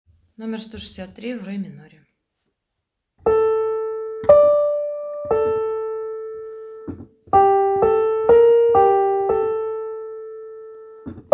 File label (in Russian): melodia v re minore